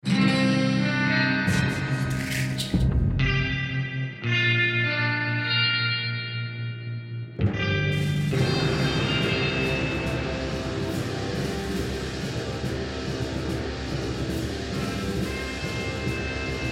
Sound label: Victory; Secret Ponchos; Victory Cam